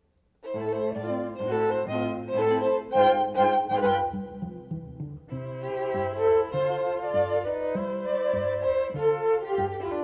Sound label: string quartet